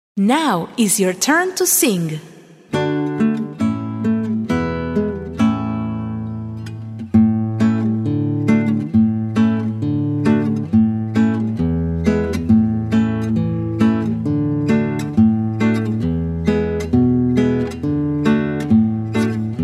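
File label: ABC KARAOKE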